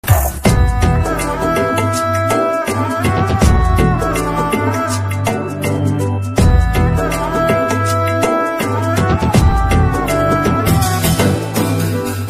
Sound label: song bgm ringtone